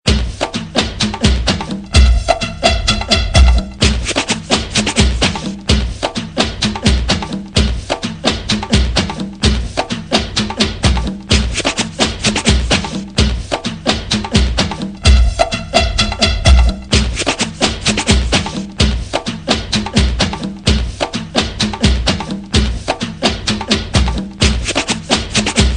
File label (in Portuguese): bases instrumental base de funk tambozao rapid